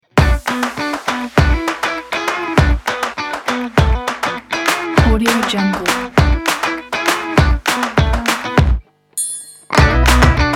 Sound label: happy rock